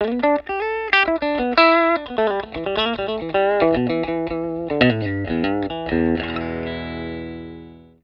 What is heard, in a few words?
STRAT BRIDGE LICK, Bridge pickup through a 1950 Fender Deluxe